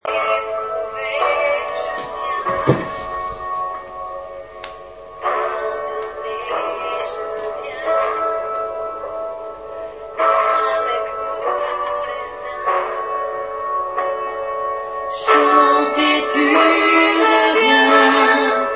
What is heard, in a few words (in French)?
voix eleo ellleie en le